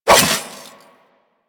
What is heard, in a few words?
Nikana Swing A; Revenant